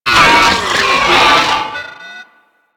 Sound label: Moa Charge Scream; Warframe